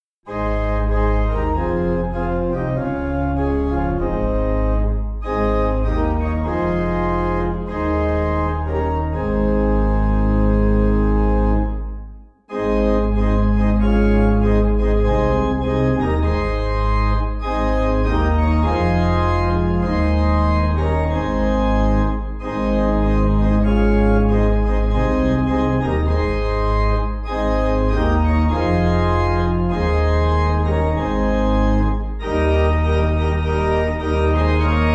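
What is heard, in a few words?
Pipe, Piano, Band, Pipe Organ; O Full Of Mercy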